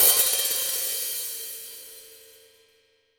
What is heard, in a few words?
OHH, Real Drum Kit, SWING KIT; Best Service ProSamples vol.43 - Real Drum Kits